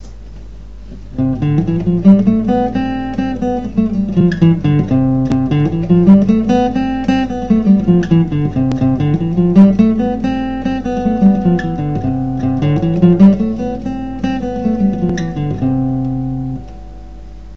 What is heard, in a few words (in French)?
folk, guitare